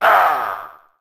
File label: pain; En cooke